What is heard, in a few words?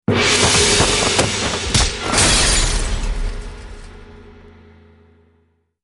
Arts Alerttone